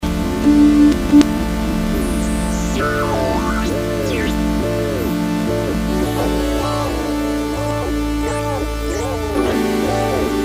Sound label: korg z1; synth